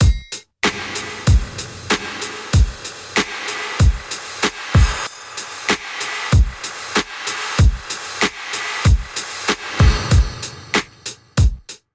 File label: pump Rev